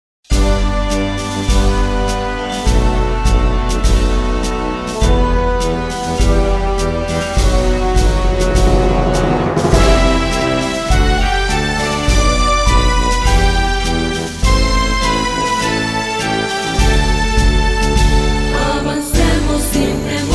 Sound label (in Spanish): Himno Guias Mayores; Ministerio Juvenil San Fernando Uno - Guias Mayores